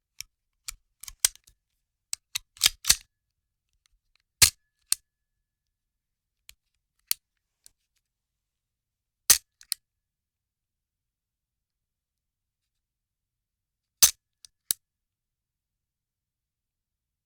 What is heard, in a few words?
handgun trigger pull empty